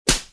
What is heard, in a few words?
miss; mine